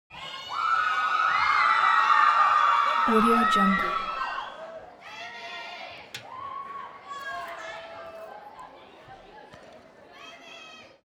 human crowd shout young audience theatre back; smartsoundfx

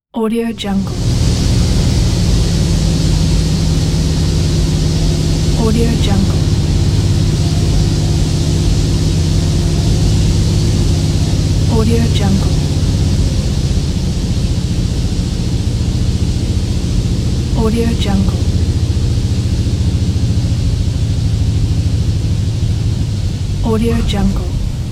prop plane landing interior

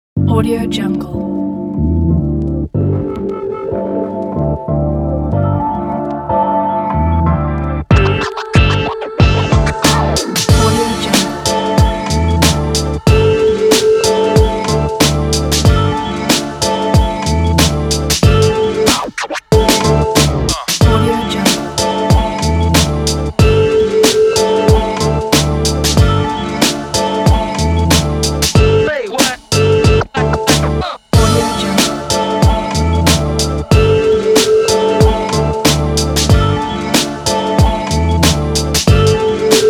hip hop; intelligentmusic

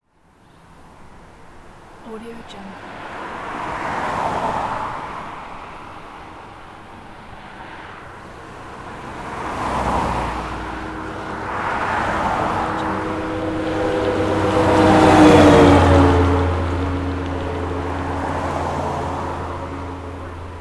cars passing by

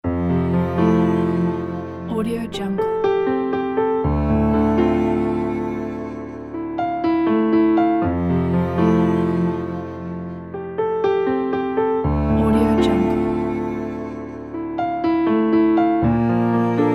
piano sad and sentimental

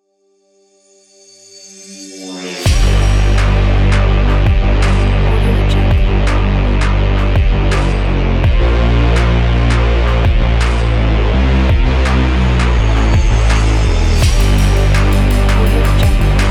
dark experimental

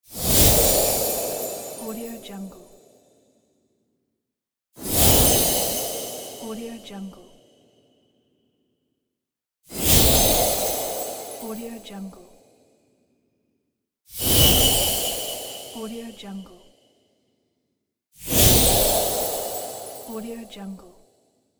christmas magic; gamechestaudio

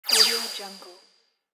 laser

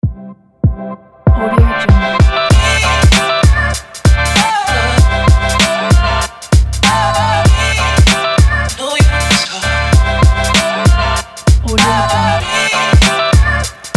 hip hop kit

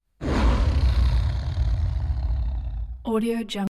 thunder with lion roar searing blast growl

